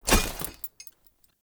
Heavy Grunt Foley Body Fall; Sinecure; Enemy

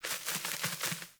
Sparrow Five Wing Flaps; Animals

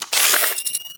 Igniter Pistol Reload; Warframe; Weapons; Corpus

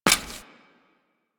Step Default, Footsteps; Foley; Warframe; Grineer Royal Jester

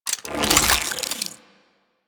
Tonfa Unequip; Crp Tonfa

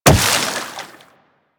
Wall Jump Water; Footsteps; Warframe; Tenno; Wall Run

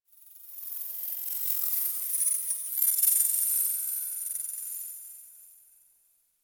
Tech Texture Small, Ambience; Warframe; Cephalon Weave; DE

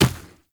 Infested Medium Run Dirt, Footsteps

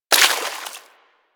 Bullet Impact Debris Water

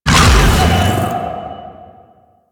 Howl Attack; Warframe; Warframes; Voruna

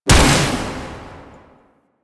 Tendril Grasp Weapon Fire A; Warframe; Broken Frame

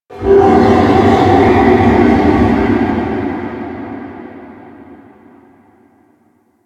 Ambience Hub Red Veil Low; Warframe; Tenno

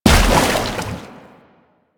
Step Water, Footsteps

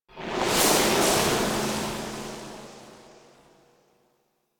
Harvester Player Warning; Corpus

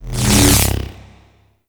Ground Laser Retract; Enemies; Abilities; Warframe; Teralyst; Bigalyst

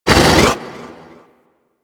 Rock Elemental Attack Vocal; Warframe; Brawler

